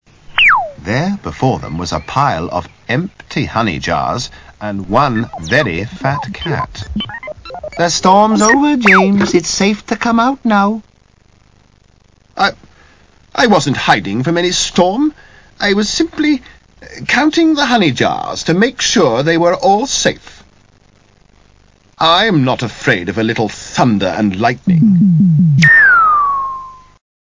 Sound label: storm; classictv; jamesthecat